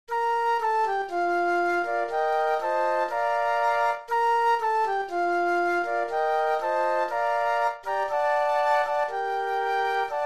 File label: Flute Quartet; christmas